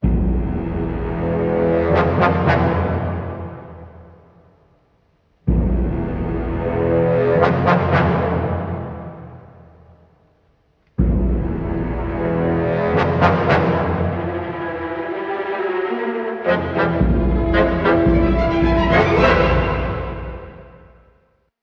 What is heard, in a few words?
strings horns, Sad Loops